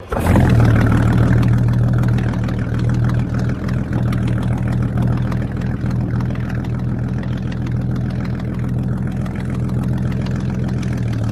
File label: V6 Chris Craft Strt, boat engine